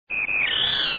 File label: Geep Double